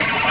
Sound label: rush; Amiga Soundtracker sample